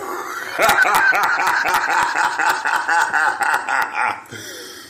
laugh

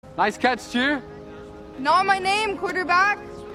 nice catch cheer

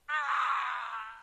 death sound; dead rails

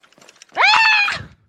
scream; beyond scared shitless

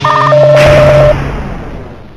rocket; killdroid

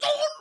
wolf grunt

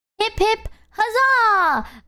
hip hip

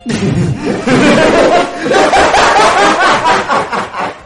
laugh insane

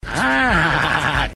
aaaahahaha

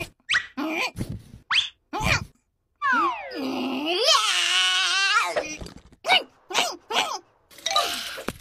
tada pop toms; chukpa new

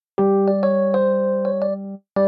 small victory

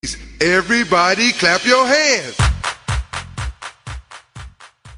clap fade; ice cream

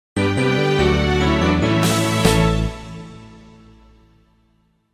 1up; srb2

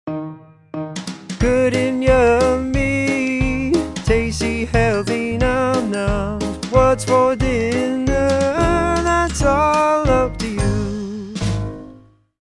menu outro

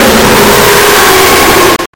jumpscare sound; fnati remastered 1 0

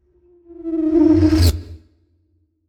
hoverbike woosh

